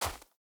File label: wolf footstep snow hard; Rust